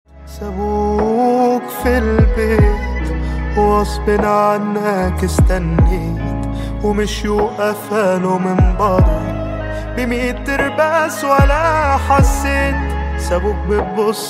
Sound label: SOFT ARABIC MUSIC RINGTONE